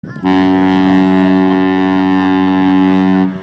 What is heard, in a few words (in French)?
bateau